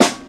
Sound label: natural acoustic snare f key